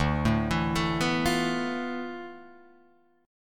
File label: D Minor 6th Add 9th D, Guitar, Open G